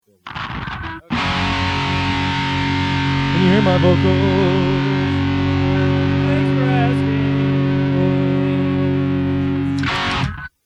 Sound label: can you hear my vocals